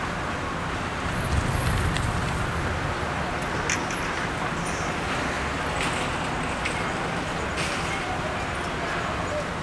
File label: ambi town; Mafie 1